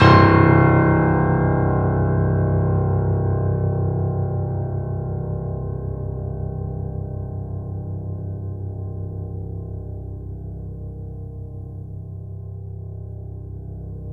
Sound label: Piano, YAMA SUSTAIN; InVision Interactive; The Piano